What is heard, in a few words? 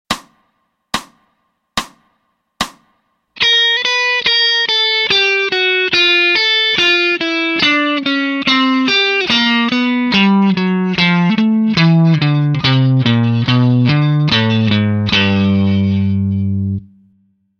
Mixolydian Mayhem Lick